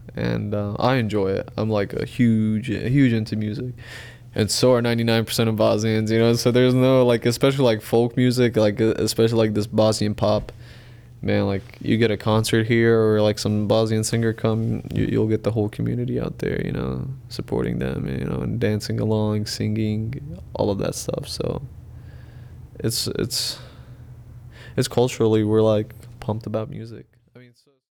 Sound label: music; cassettes